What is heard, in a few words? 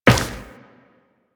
Run Metal, Footsteps; Warframe; Tenno